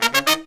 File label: Pop & Funk Brass